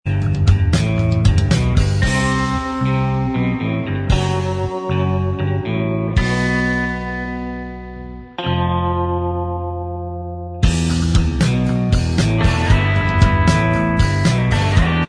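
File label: instrumental track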